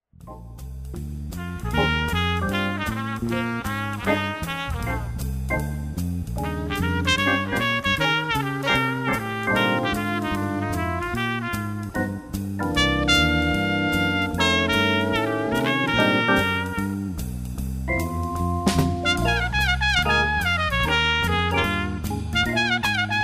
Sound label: Blues, jazz band